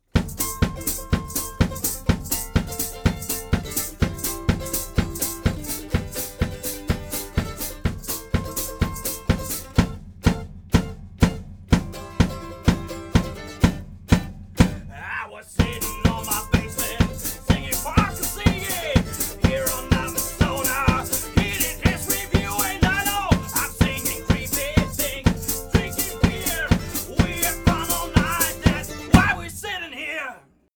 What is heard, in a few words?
Oktava MK 012 01 plus Air; Ein bisserl Spass am Oktava